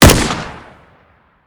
g36c shoot; daffas arsenal; tacz

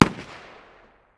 ar 556 medium distance, 5.56x45mm; tacz-tweak-pack; taczmechanics

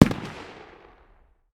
dmr medium distance, 7.62x51mm; tacz-tweak-pack; taczmechanics

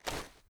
g36c spin; daffas arsenal; tacz

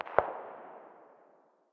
dmr very far distance, 7.62x51mm; tacz-tweak-pack; taczmechanics

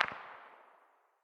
pistol very far distance; 45acp; tacz-tweak-pack; taczmechanics

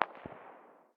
ar very far distance, 5.56x45mm; tacz-tweak-pack; taczmechanics

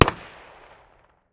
pistol 45 medium distance; 45acp; tacz-tweak-pack; taczmechanics

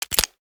reload end; taurus; daffas arsenal; tacz

